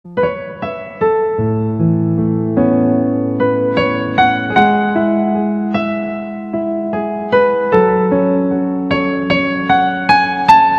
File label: piano; autumn in my heart ost